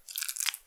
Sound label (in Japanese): 膣内射精前, 引き抜かれた瞬間; 挿入or引き抜く